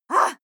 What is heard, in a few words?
female shout exhale upset short; schreie; menschen